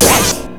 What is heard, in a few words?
POIK